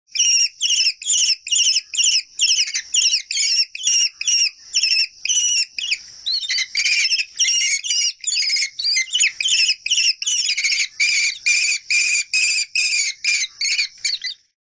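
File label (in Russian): kestrel call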